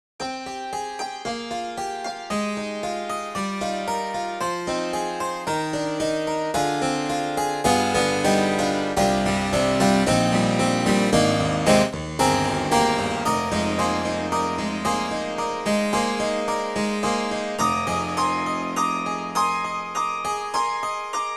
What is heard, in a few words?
Harpsichord; IK Multimedia; Sonik Synth 2